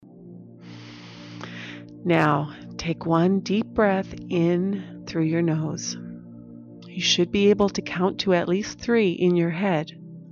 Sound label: Deep Breathing